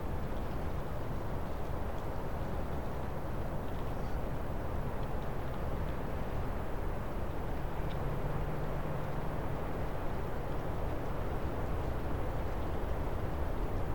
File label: Campus Outside